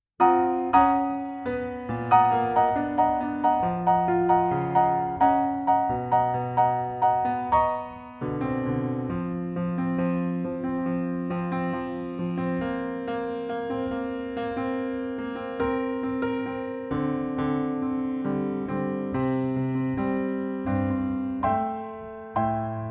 preludes

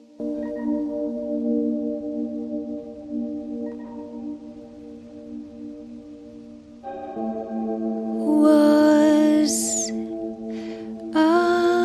voice

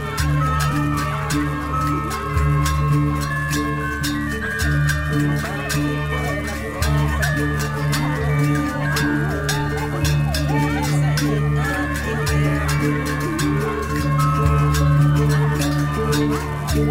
gongs

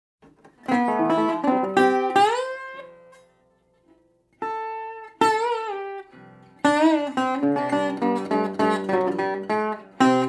music of burma

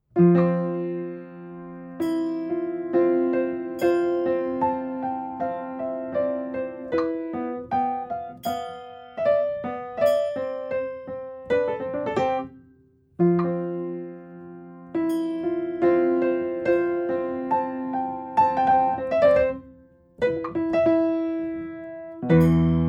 music of burma

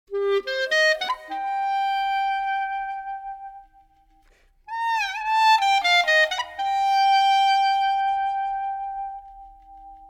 klezmer madness; Tzadik

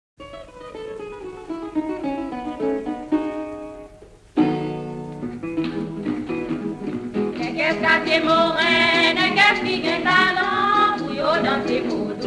haiti vodou; カリブ海の南国っぽさやアフロ的な土着的な風合い; FREMEAUX & ASSOCIES

